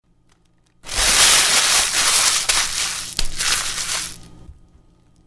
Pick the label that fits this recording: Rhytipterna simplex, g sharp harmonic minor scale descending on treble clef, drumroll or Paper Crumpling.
Paper Crumpling